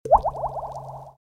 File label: beep